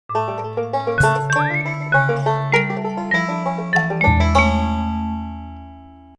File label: Musicblock